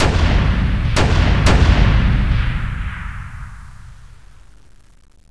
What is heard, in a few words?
weapon